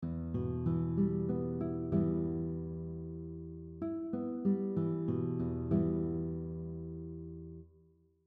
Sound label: Fingerpicking exercise 5 using all picking fingers, guitar; makes you use your pinky when playing multiple notes at once